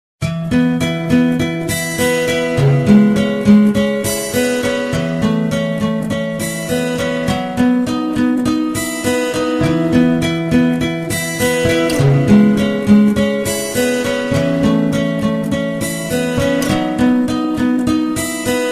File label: melodic guitar; ringtone